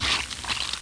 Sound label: Amoeba Move; AmoebArena™